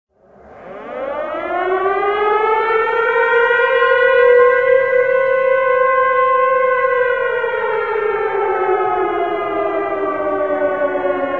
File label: siren; source engine; tf; mvm; ambient